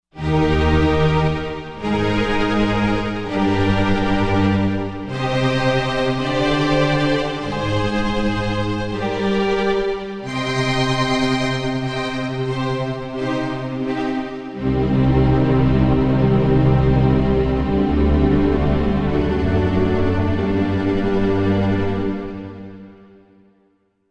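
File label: String Tremolo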